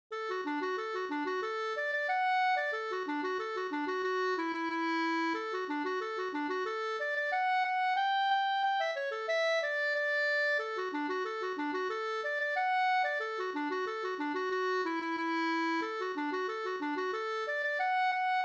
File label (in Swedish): engelska